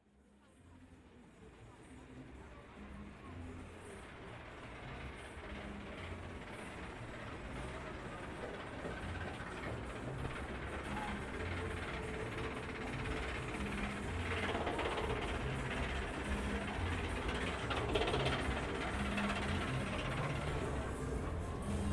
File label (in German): Achterbahn